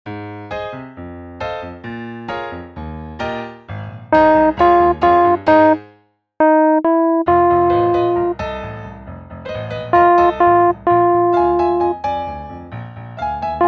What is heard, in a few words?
vocal plunk